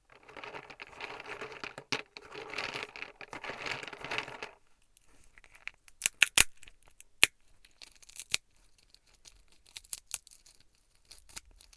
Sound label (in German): Nuesse Knacken